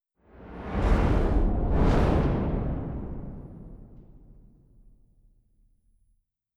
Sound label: orchestra swells; Netease; gank